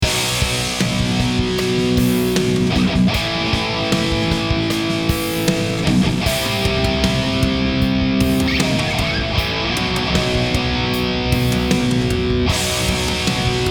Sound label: drop b, SM57; TN UBER75